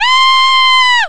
SCREAM; Duke Nukem; Caribbean vacation

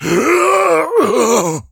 pain death; Duke Nukem; Forever 2001

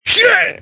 Pain; Duke Nukem; Forever 2011

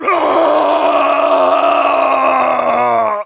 pain falling; Duke Nukem; Forever 2001

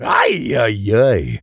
pain falling; Duke Nukem; Forever 2001

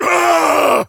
pain major; Duke Nukem; Forever 2001

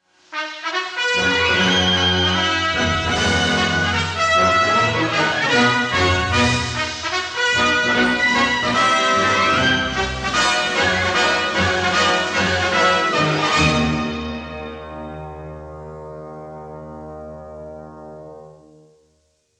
Fanfare; Indiana Jones and the Last Crusade; Indy

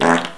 Fart

